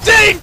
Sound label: "Ooff"; Memes